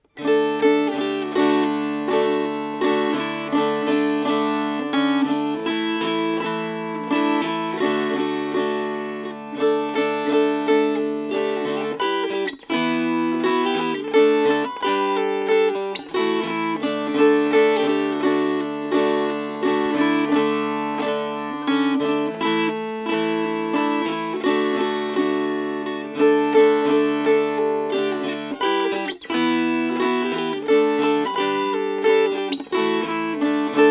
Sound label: Strummed; Jug of Punch